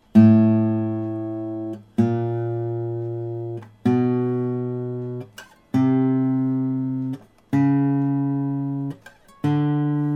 yamaha after, guitar vibration

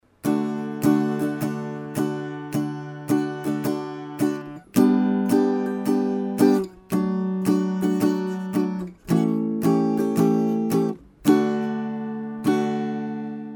kids guitar

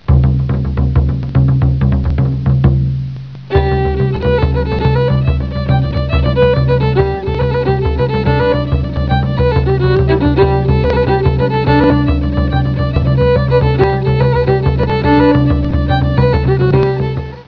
bodhran

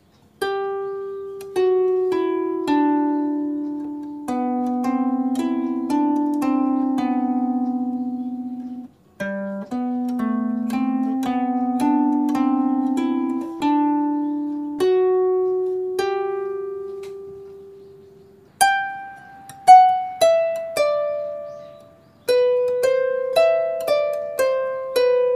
kinder harp

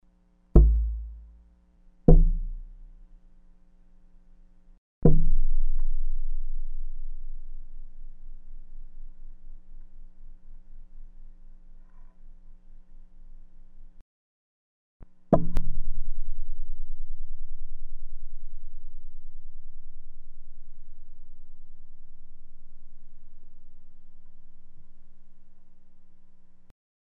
bow mechanics; Gabriel G30

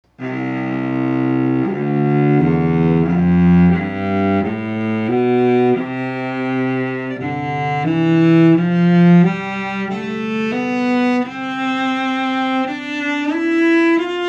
steel cabon, cello

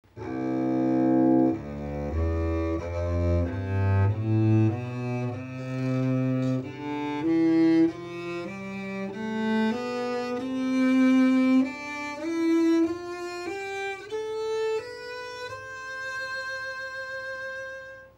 cello, carbon ss100 and superendpin

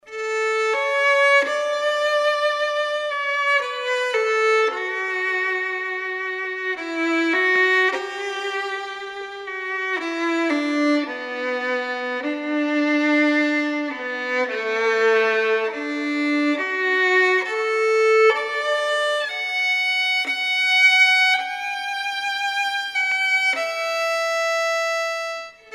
fishman b3, acoustic

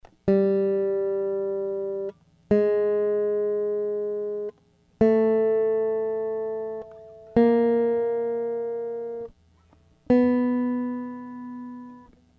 yamaha fishman, guitar

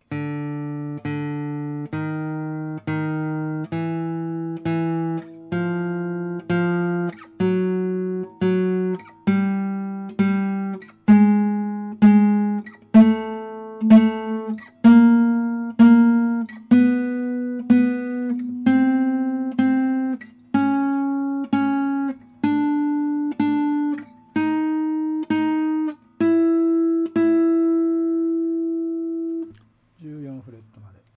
guitar vibration

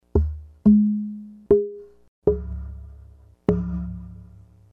bow mechanics; G-30

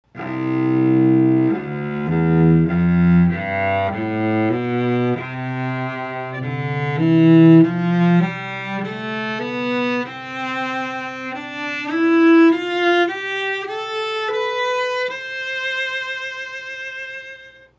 Montagnana, cello

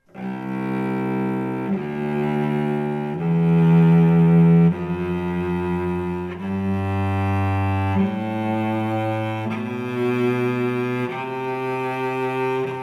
cello, soundpodium

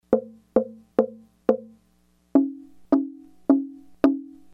GR KIT W tap, guitar taptone

